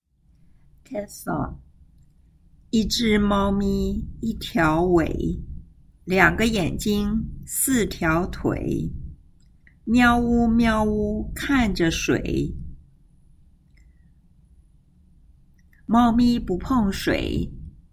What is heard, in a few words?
Cat Song